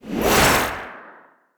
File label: super power transformation